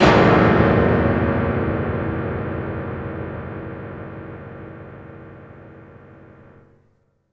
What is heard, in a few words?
Gospel Pound; blair witch volume i rustin parr